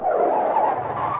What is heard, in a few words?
creeper die